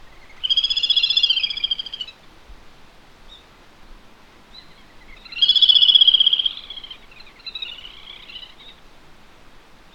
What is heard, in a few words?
grebes